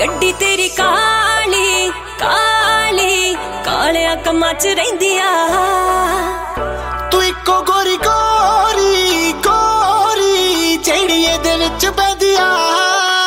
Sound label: Punjabi song